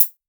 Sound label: Hat, Dubstep One-Shot Drums